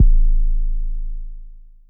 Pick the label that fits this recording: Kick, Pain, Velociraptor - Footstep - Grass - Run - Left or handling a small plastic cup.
Kick